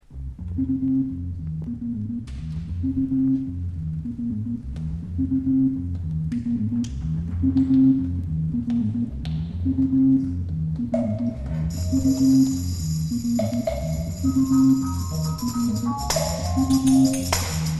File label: piano